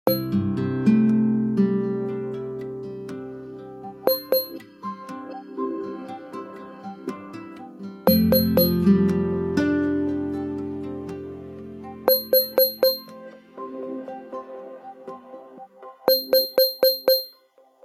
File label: Clock alert, Nokia alert tones; Nokia C2-01